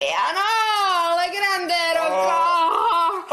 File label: piano